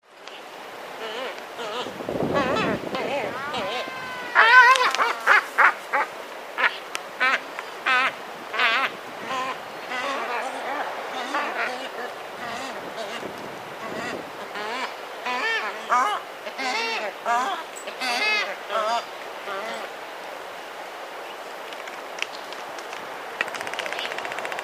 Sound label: phoebastria irrorata; albatros galapagoski